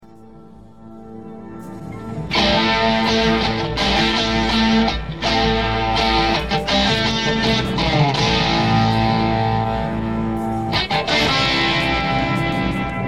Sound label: guitar extraction